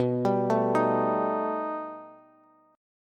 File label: B Augmented 7th B down Bass; arpeggiated; JGuitar